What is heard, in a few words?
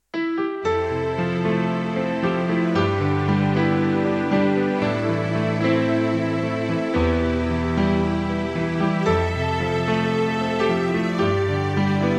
level piano